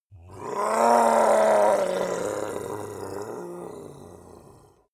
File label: monster dark growl; kreaturen